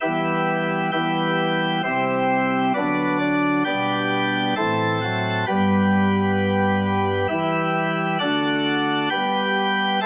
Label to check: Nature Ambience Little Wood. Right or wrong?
Wrong